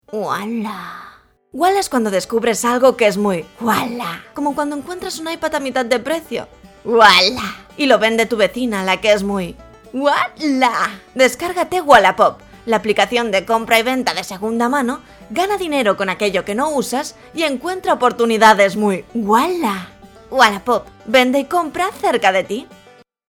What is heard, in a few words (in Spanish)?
muestras walla